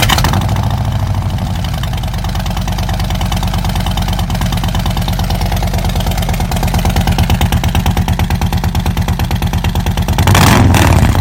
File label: Non-Baffled 51 inch cholos Milwaukee 8